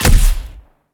pistol up

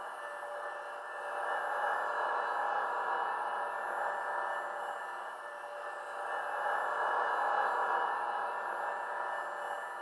field elefield loop

